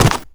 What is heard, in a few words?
Inventory Drop; FlyForInsanity